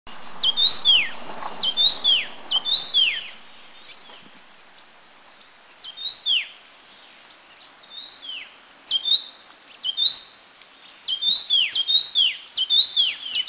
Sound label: Taiwan Yuhina Blue Gate; Yuhina brunneiceps Country endemic; fairly common in flocks